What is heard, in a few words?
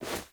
Movement Foley J; Wisp